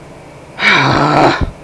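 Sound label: frustration; People; Sound Library 2000; Wayzata Technology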